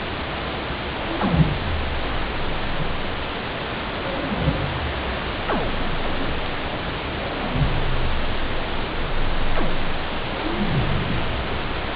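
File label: North Pacific Right Whale, baleen whales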